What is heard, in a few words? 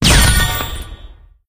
Magic Box Explode